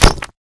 bullet light ballistic concrete close; Titanfall 2

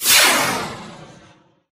ignite; Titanfall 2

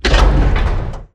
crane move start clunk; Titanfall 2

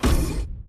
footstep sprint close; Titanfall 2

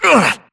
grunt hits; Titanfall 2

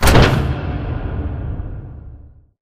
pod door lg verb open start; Titanfall 2

